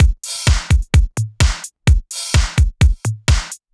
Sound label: Housebeats, 128BPM; Vengeance Samples; VEH2 Loops